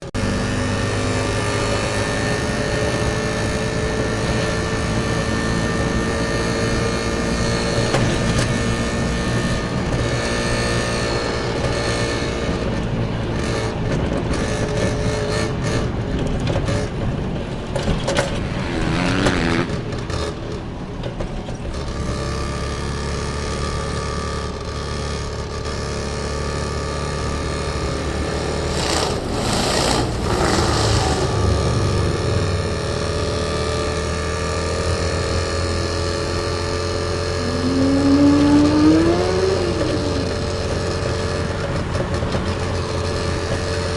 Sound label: multiple motorcycles highway; SFX; DailySounds